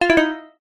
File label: multimedia ui tone short bright organic generic notification